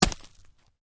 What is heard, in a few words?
Ice impact lite, Ice: light impact with debris; Snow; Weather; Stuart Duffield